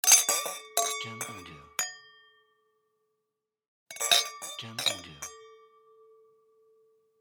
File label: Glasses Wine